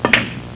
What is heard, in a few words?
play, household; Web Clip Empire 150,000